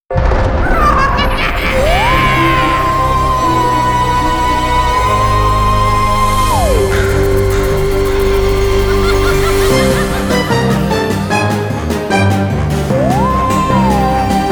scary music creepy fearful spooky halloween background intro theme